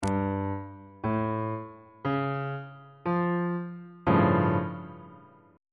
g double sharp augmented 7th 3rd inversion on bass clef